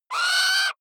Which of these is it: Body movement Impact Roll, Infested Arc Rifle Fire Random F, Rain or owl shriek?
owl shriek